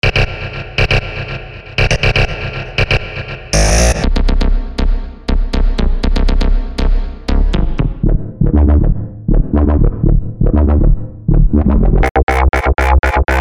dub bass loops

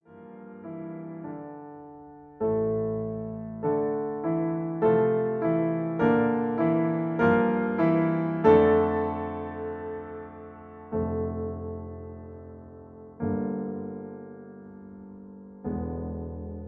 Accompaniment D

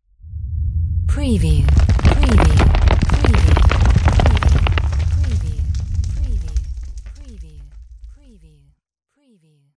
ROCKS LANDSLIDE

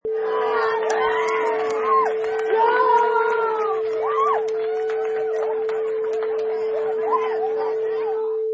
Crowd Applause Outside

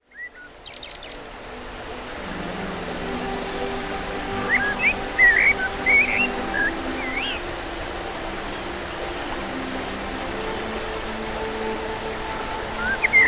Meditation Music Relax Calm Water

